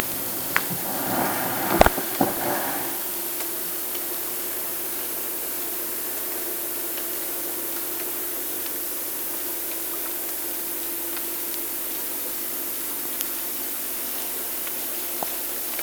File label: Orca sound Night Passby